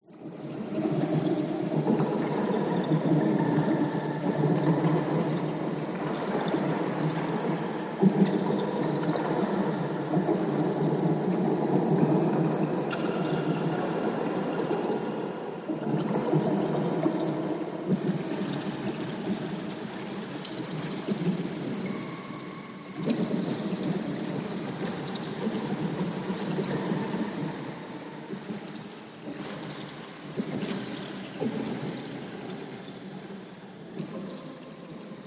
science fiction alien planet weird watery bubbles movements